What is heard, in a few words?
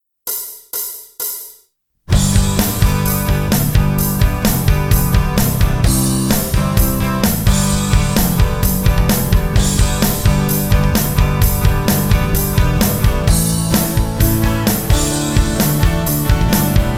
melody; startup optical